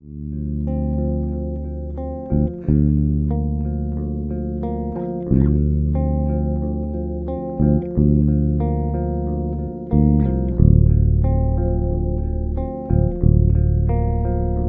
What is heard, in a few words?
pendulum fall